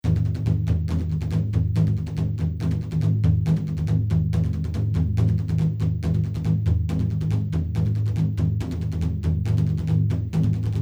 Epic

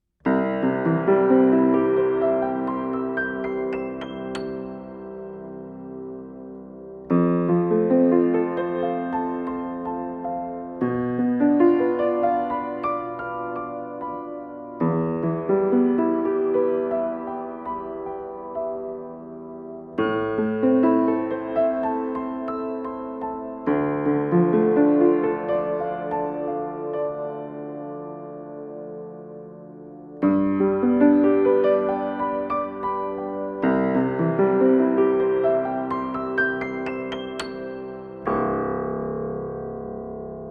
Piano, Mics Inside, Open lid; Audio-Technica 803

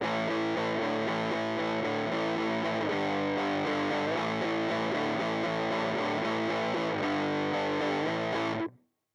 Overdrive Stacking Overdrive x 2 Circuit A

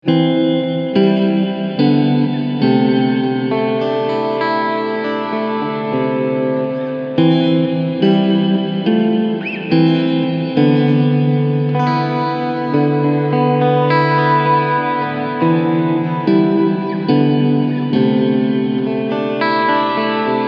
Long Reverb

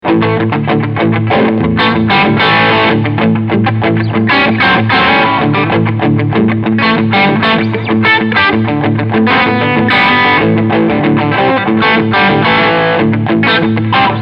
Brit LowGain

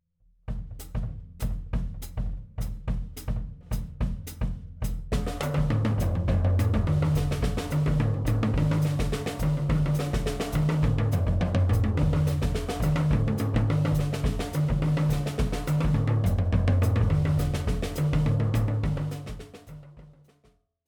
Drums

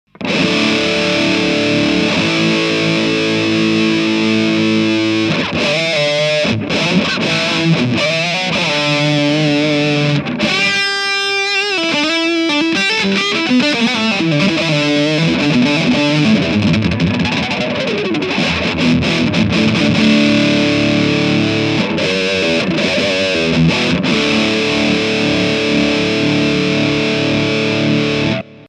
Peavey Rockmaster Ultra; Paula Steg

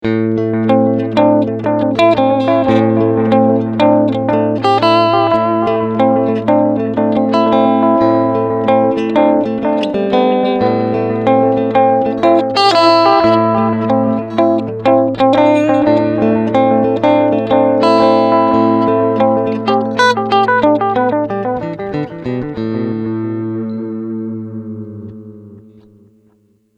Preset Analogue

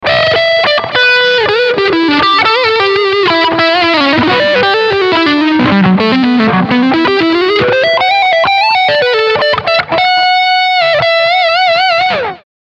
Leadsound Front

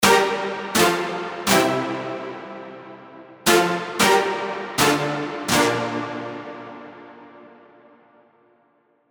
Big Stab of Things; Nexus 3; KSHMR Expansion

